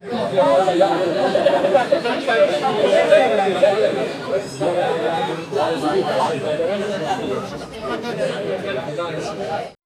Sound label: a busy medieval pub with